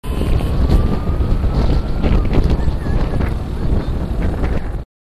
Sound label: loop